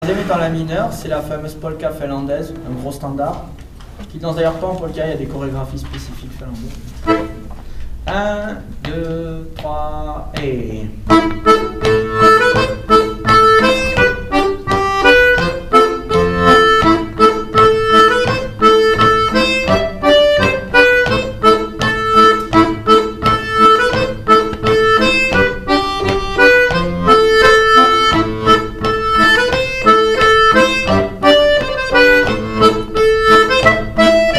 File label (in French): polka lent; Finish set